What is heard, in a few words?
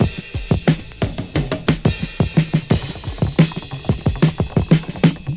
tambura; Drum loops